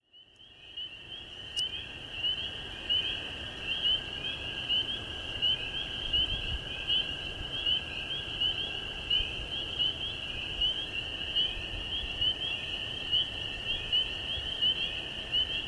Spring Peepers and American Toads Stream Restoration Site; Mid Location